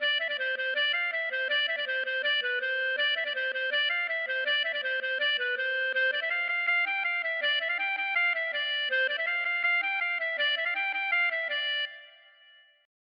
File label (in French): maraichine; Maraichine : Allez-vous-en